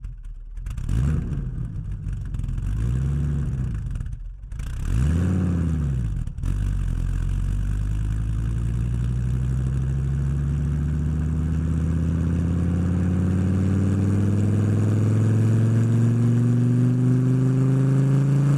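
Dodge Viper GTS Race Engine - onboard - RAMPS - Drive Stop Off - EXHAUST - Left; Dodge Viper GTS V10 Race Engine 2014; DPA4062; Pole Position Production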